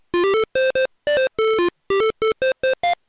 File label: points; goldrush